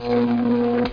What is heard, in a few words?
shock